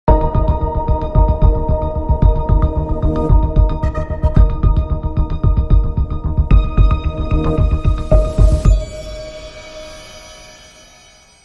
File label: Crime Sting, No Strings